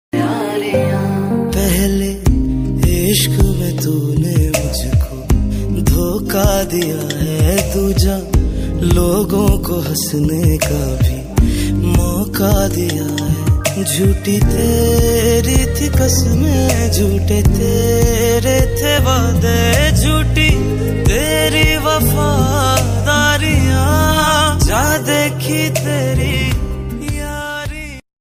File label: OST pakistani ringtone; Yaariyan Ost